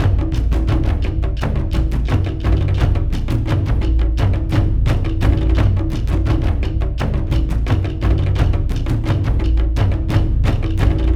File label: Drums; For Honor